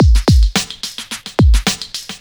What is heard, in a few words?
Beat, Drums & Percussion; Electrocution; Beats